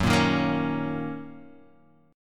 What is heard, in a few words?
Fsharp 6th down, F#6 chord; strummed; JGuitar